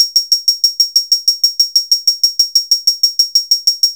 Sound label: loop 94; AMG - Vince Clarke Lucky Bastard